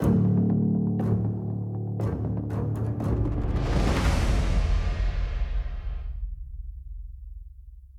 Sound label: Action 120bpm Intro; project zomboid; More Are Coming